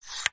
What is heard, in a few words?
technology mixing desk fader slide; zapsplat